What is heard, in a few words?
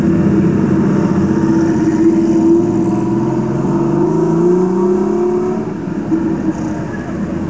sports car engine